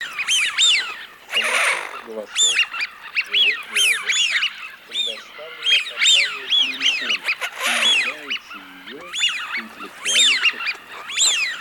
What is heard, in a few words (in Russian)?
Свиязь Fischione; plurifon